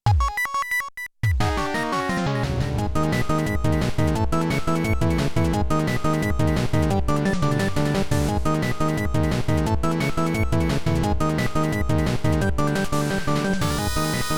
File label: Gravity (Internal Percussion); Cave Story